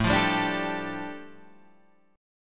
A 7th Suspended 4th Sharp 5th, A

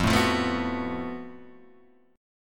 Gb Minor Major 7th Double Flat 5th F

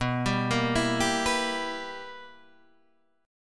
B Major 7th Suspended 4th Sharp 5th, chord

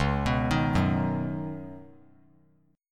C sharp 9th Suspended 4th C sharp down, chord; C#9sus4 arpeggiated; JGuitar

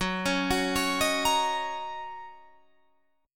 Gb 6th, Guitar Drop D

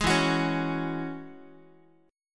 E 6th Add 9th E down Guitar; E6add9 strummed; JGuitar

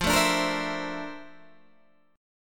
Bb Major Sharp 11th F, chord

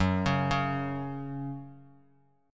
F sharp 5th, down, Guitar; arpeggiated; 4F,4A,5C,5G,5C,6E; JGuitar

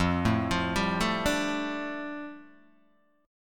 F 6th Add 9th F down Guitar DADGAD, chord

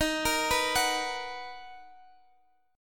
B Major 7th Eb

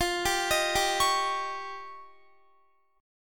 F 7th Suspended 2nd Sharp 5th, Guitar; F7sus2#5; arpeggiated; JGuitar

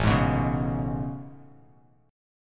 A Major 9th A, Bass Standard

